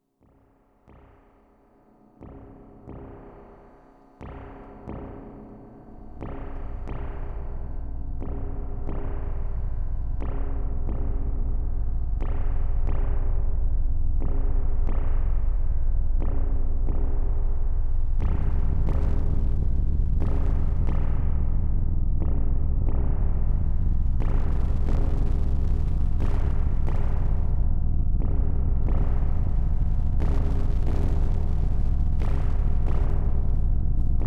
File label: noise session